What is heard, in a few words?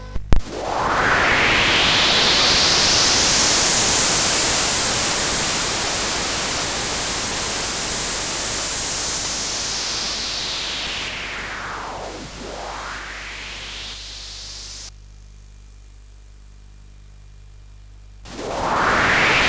intro, sid CSG8580R5